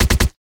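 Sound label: quiet accurate automatic rifle shot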